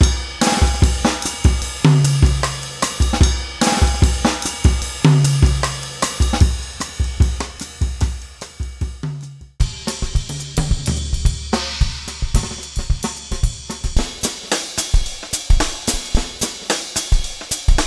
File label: jazz; jazz cats